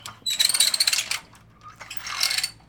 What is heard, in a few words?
Spinning Creaking Wheel